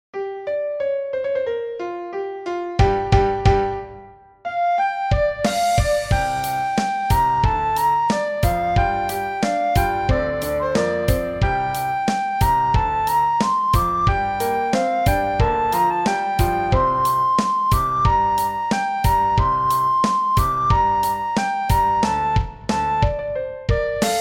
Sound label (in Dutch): sopraan; flierefluiter 6